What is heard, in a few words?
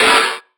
Snare